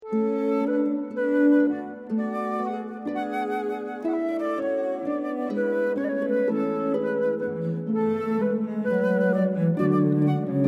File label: harp flute cello; Handel